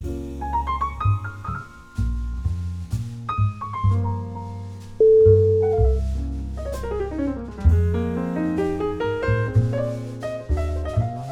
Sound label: romantic night in paris